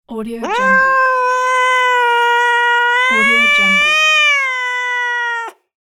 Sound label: angry cat meow; catsoundstudio